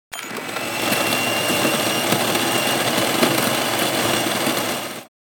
Coffee grinder noise; appliances

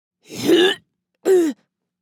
Young woman retching

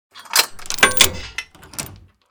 Metal chain lock unlocking; gfxsounds

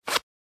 Baggy pants zipper opening or closing; Clothing; Clothing Sound Effects

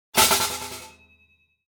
Cartoon accent crash broken metal object

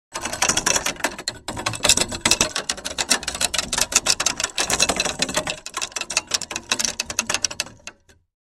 Rusty gears mechanical rattle

